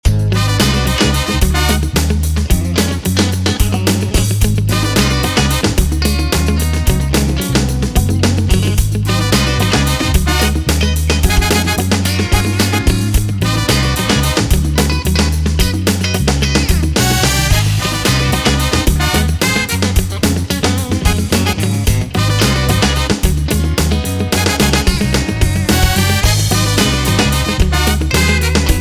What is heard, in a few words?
funk; urban